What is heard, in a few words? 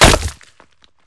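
Bullet Light Ballistic Concrete impact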